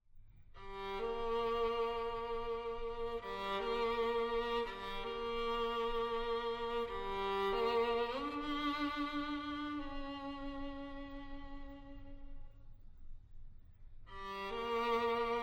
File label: Nigun for string quartet